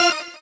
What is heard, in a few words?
normal hit normal